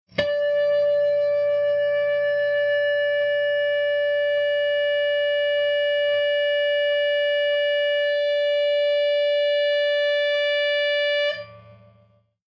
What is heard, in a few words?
Elect Guitar Feedbac, guitars